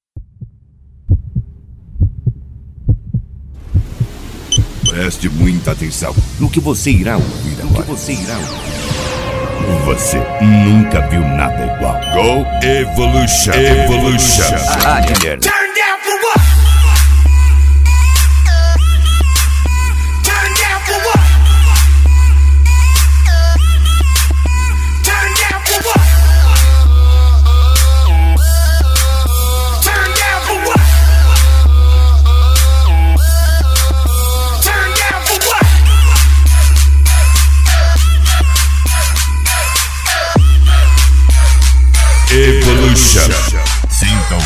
funk tumdumdum funk bass

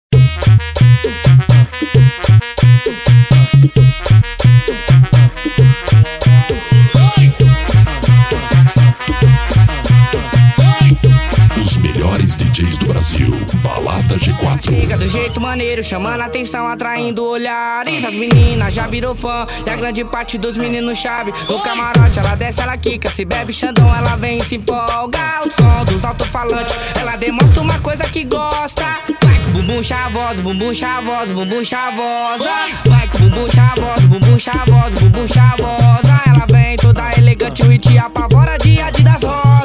funk bass; lada g4

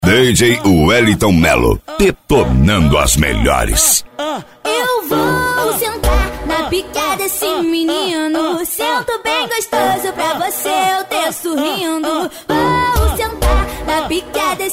funk bass; impacto som

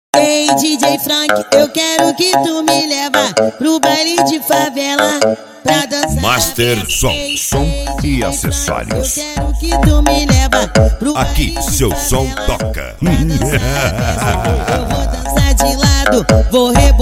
funk

